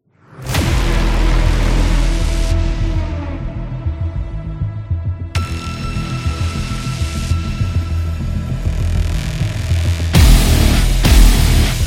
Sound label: Heavy Doom Dark Metal